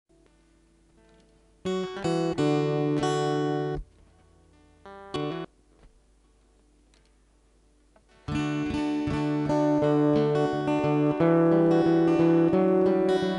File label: Bubbling River Acoustic